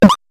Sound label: bounce